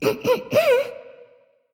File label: clear throat